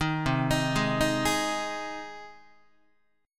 C Minor Sharp 5th C down Guitar; arpeggiated; JGuitar

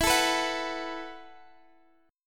E Suspended 2nd Suspended 4th E, Guitar, Drop D; x,19,19,16,19,x; down

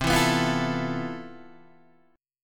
C Major 13th C, Guitar DADGAD

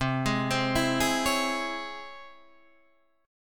C sharp Minor Major 7th, chord

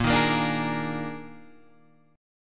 D sharp Major 7th Suspended 4th, A sharp

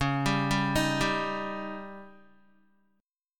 C sharp Suspended 2nd Flat 5th, Guitar; arpeggiated; 8,10,7,8,7,x; 4F,4A,5C,5G,5C,6E

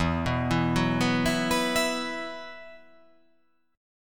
E Suspended 2nd E, down

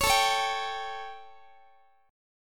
A 7th Guitar, chord; strummed

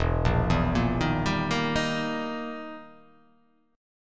E Minor Major 7th Flat 5th; chord shape

